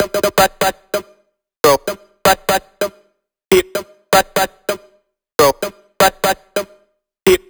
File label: Melody Kits 128BPM Root C; Vengeance Samples